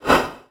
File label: short breath; dark king